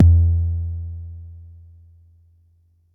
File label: Bassdrum; Jomox Xbase09